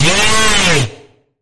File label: Sharp Bend, A; Sharp Bend Ahead